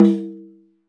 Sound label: GT SK T, Skin Tamb; NorthStar - Global Instruments VOL-2